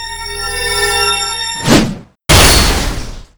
Big Yeti Skill

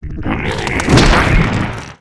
Vehimoth Undeath; Seer; GamigoNA

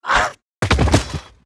die

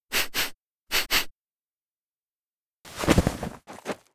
Snowy Puggy Stand

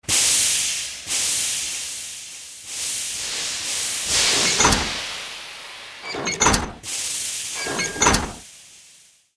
Steam Walker Idle; Seer; GamigoNA

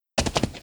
Snowy Puggy Run

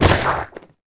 Mage Book Dmg; Seer; GamigoNA

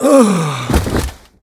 Mech Die; Seer; GamigoNA

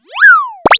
slime ice regen; Seer; GamigoNA

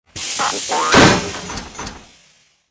Steam Walker Action; Seer; GamigoNA

